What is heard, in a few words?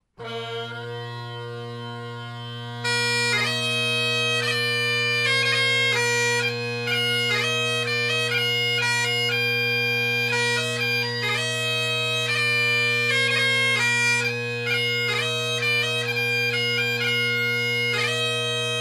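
Bagpipe; recessional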